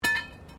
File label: METAL SPIKE FALL; Materials